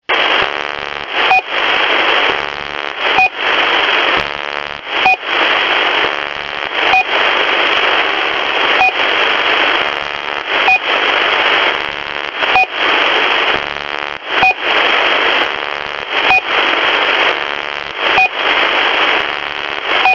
channel marker buzz

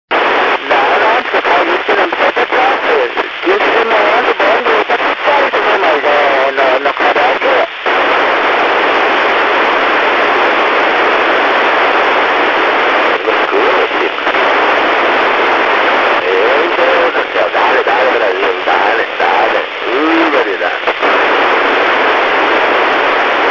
milsat 261.73 mhz fm

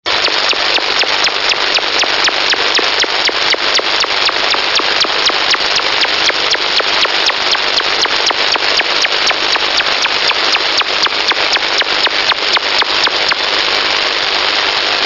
maybe radar

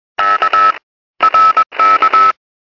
cw squelched

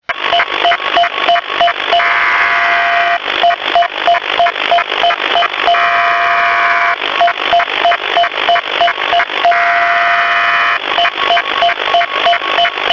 weird data bursts

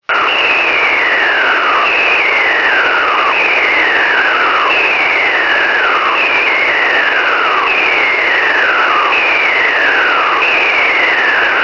milsat weird carrier

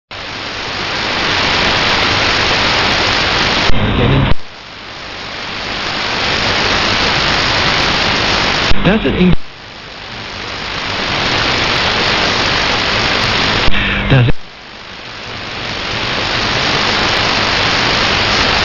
sw broadcast transmitter flapping

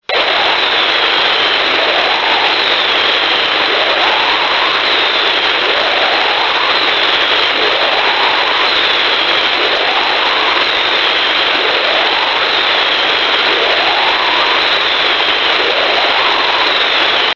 snoring radar pulses

